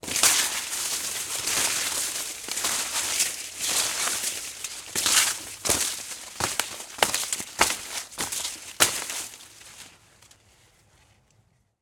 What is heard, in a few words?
Corn Stalk Movements; InMotionAudio; Sound of the Corn